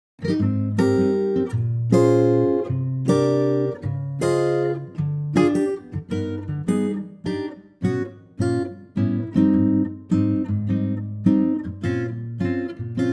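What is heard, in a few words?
Ab Ac Gtr, male; key - Ab - vocal range - (optional Ab) Bb to Eb; An intimate acoustic guitar arrangement; An intimate acoustic guitar arrangement of this lesser known standard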